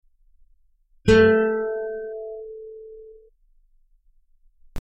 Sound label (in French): guitare